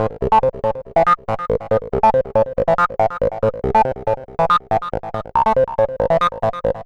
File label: Synth, Synths Lead; Dance